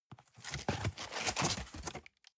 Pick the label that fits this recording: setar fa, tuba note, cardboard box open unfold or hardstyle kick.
cardboard box open unfold